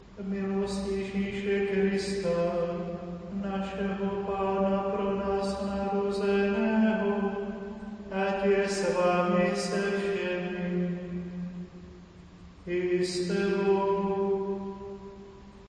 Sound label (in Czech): pozdrav milost jezise choral